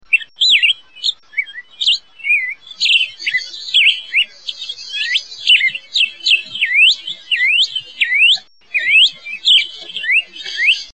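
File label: Black-headed Grosbeak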